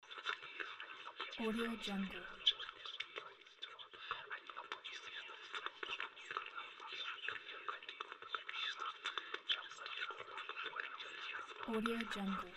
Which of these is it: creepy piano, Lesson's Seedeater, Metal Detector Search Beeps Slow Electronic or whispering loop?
whispering loop